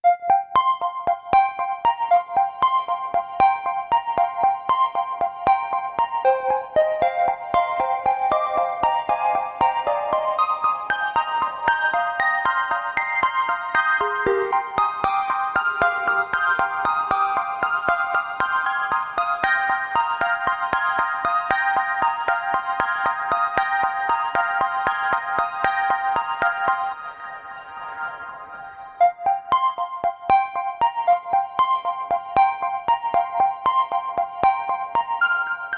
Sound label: Melodic